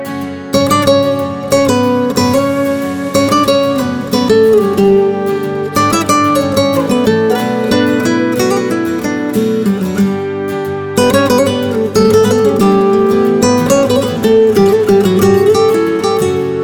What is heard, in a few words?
Ringtone